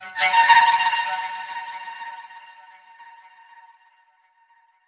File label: Backlands Nature Signal